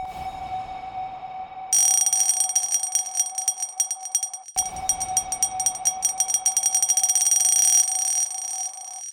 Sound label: Spooky